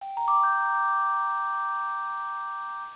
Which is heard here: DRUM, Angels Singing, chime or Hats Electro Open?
chime